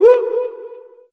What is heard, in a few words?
"woo", male